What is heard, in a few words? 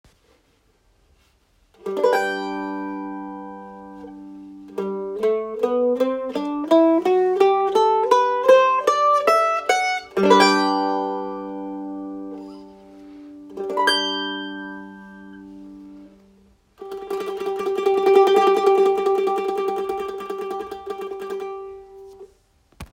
gibson a junior mandolin; klangbeispiel; 1921